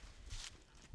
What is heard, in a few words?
Footstep On leaves